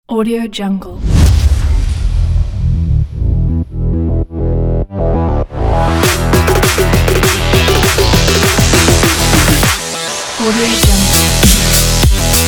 action sport music kit

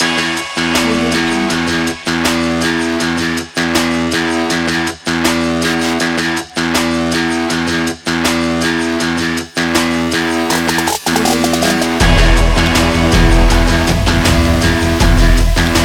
trap rock

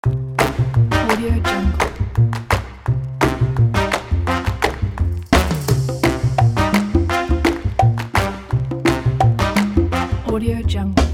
quirky and retro fun

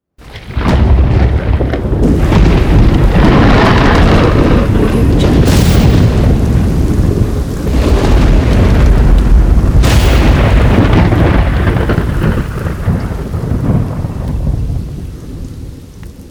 thunder storm

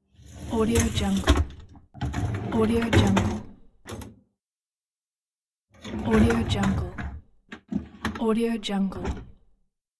dishwasher rack opening and closing; eternal-future-productions

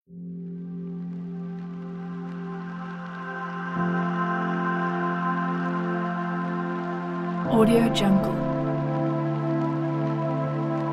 modern electronic ambient

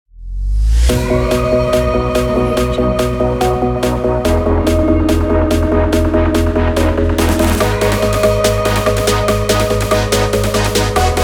future bass

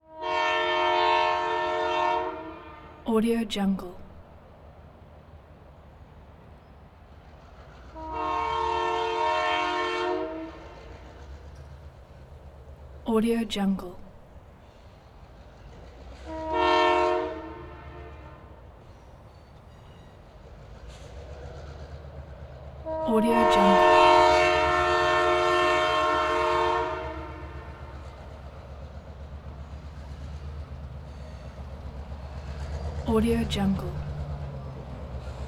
train pass by

